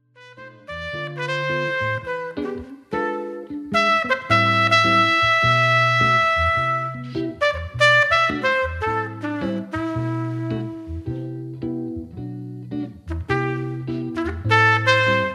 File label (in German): Gitarre Trompete; Coda Club